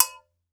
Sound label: GANKOKWE, Drums&Percussion, Gankokwe Bells; E-MU Producer Series Vol. 7 – Old World Instruments